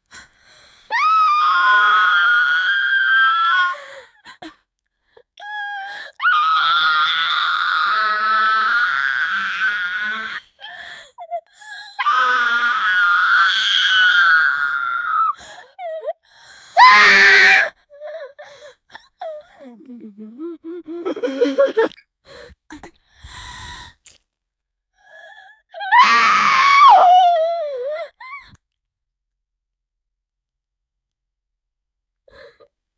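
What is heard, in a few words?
a female gurgling and screaming; blubbering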